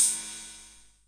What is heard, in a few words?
Korg KR-55 cym; drum machines